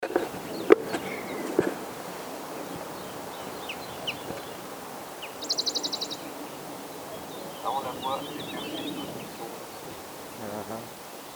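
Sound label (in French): alouette lulu bruant zizi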